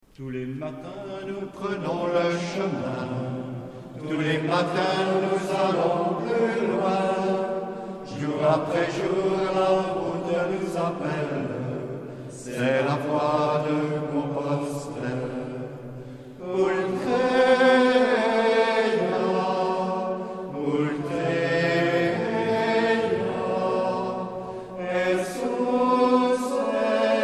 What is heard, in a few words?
ultreia chant